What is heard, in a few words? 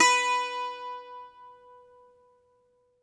Greek Bazouki, Plucked Strings